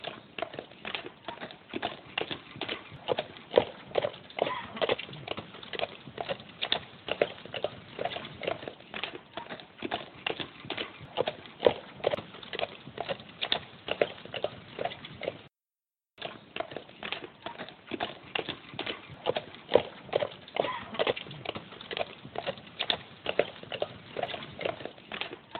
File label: Jauntting car long